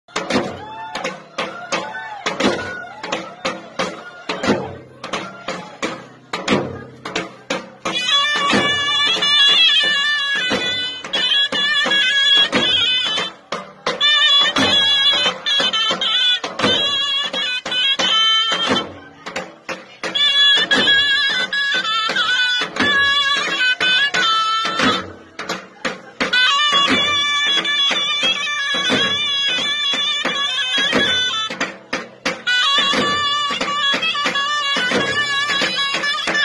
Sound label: Dohol Bakhtiari; دهل عزاداری چپی بختیاری